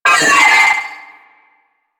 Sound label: Trooper Vocal Pain; Warframe; DE; Sentients